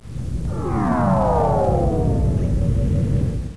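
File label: POWER DOWN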